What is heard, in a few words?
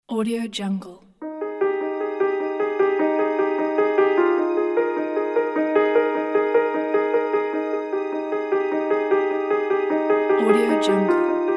emotional inspirational piano